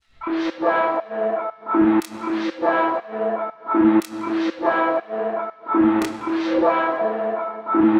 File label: 120bpm, Atmosphere; Melodeep Kit 2